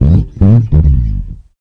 Bass FX C, Bass Guitar Licks FX

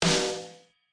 Steal Snare, DRUMS, REAL LIVE KITS; Osama Qureshi DrumKit

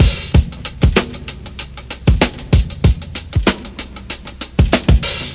classic break, DRUM LOOPS and BREAKS

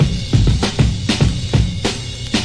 98 dirty perma ride, drum loops and breaks; 091 to 100 bpm

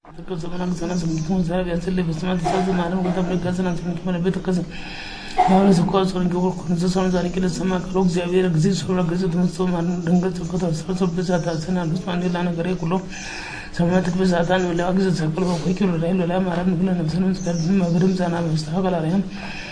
christian monk chanting; MALE MISC and SPOKEN WORD

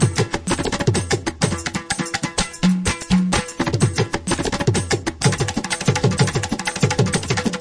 tabla madness, 126 bpm

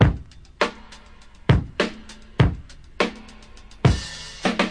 dusty drum skit, 102 bpm; 101 to 110 bpm

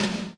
tom; REAL LIVE KITS; Band Called Yes

